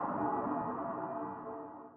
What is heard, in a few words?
sonar Tail Air Medium Shuttle Directional; Barotrauma; Real Sonar